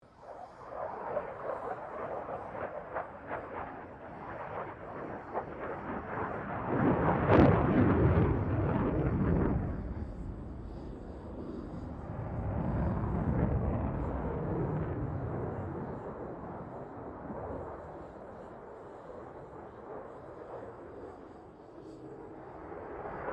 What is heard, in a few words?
AIRCRAFT FA-18 HORNET PASS DISTANT; RAAF FA-18 Hornet; Airplanes; Vehicles